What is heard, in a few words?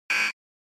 Electromagnetic Buzzer, Dry